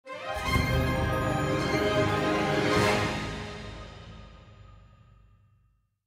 Boss (Sting); the lego movie 2 videogame macos ps4 switch windows xbox one gamerip 2019; Chameleon Boss

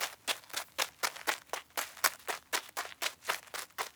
Footsteps Dirt transition long; Idle Champions of the Forgotten Realms

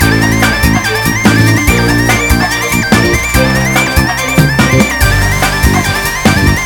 boss loop 144bpm

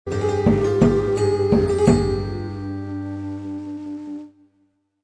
Egypt Music Short; Civilization Revolution

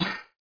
Metal Impact; A Robot Named Fight

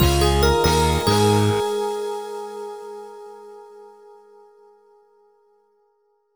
Level Completed; Strawberry Shortcake The Four Season Cake

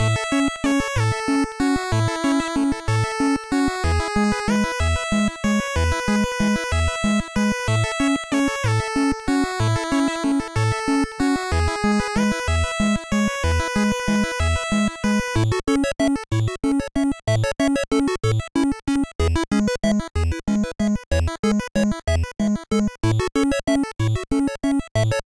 Easter Egg Music; Alwa's Awakening

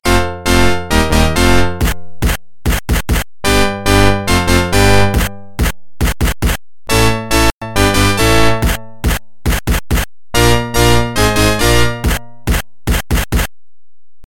Organ; NHL 94

